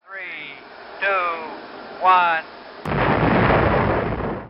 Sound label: rocket